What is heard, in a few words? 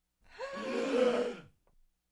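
Crowd exclaims in surprise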